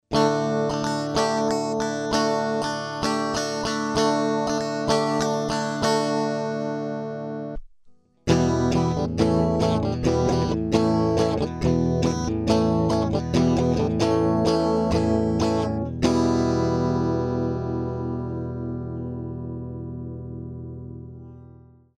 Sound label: roland rubix44 hiZ comp2